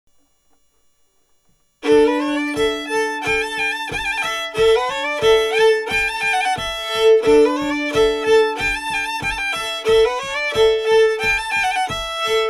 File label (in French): Marche; Marche de piau long; d'après L Peyrat